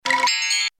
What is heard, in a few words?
pcm, roland jd800